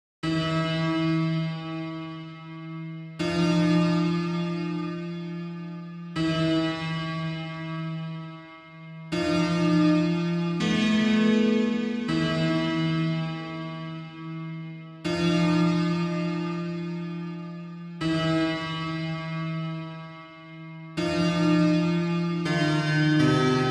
Quick and dirty piano song. I applied a reverb effect.